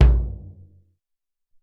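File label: Jazz Kick, Alesis SR-18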